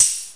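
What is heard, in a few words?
tambourine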